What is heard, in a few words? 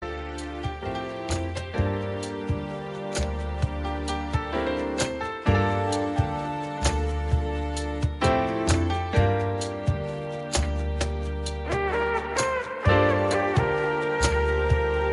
nature love background music tone